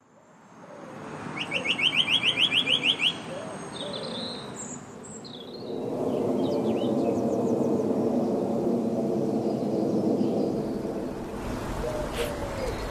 sounds of surrey

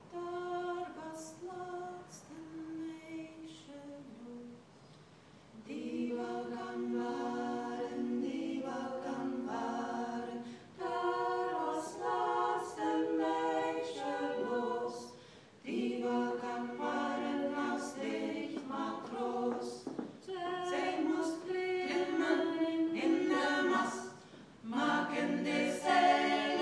singing rehearsal in Bristol

